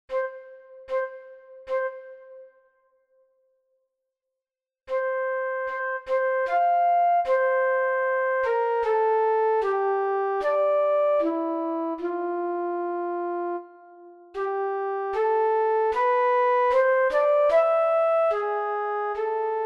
Flute; Max Reger; Mit Rosen Bestreut

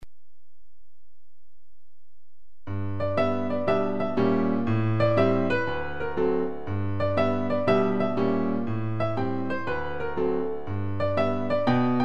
Slow-Fox 120; Seiber; Rumba Bis Walzer

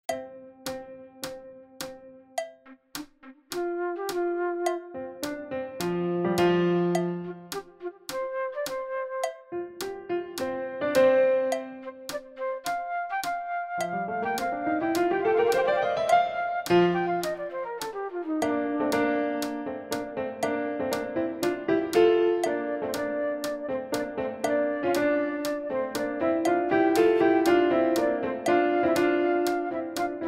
piano flute fast

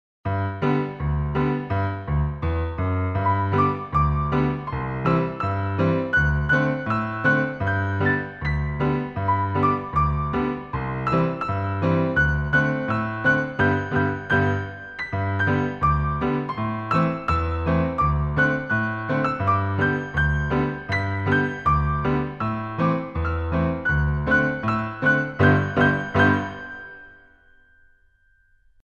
Little Brown Jug; Mit Papa

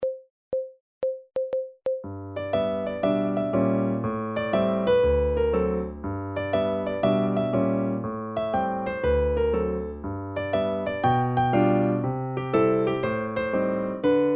Slow Fox 120 beide; Seiber

